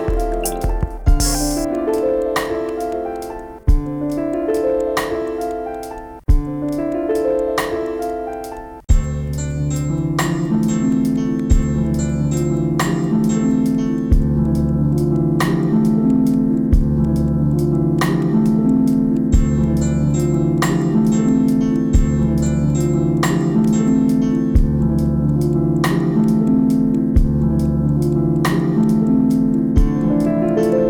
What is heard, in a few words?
Pianosong